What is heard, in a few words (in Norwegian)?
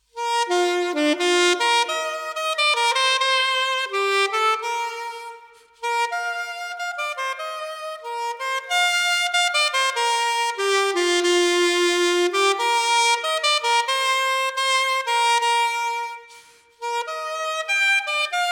sopraninosaxofon solo